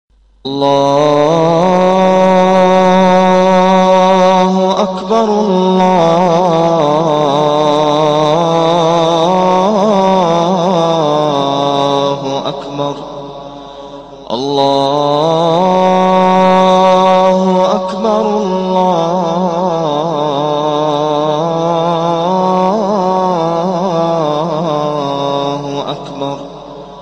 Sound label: relaxing athan ringtone